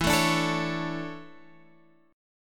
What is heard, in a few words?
D Major 7th Suspended 2nd E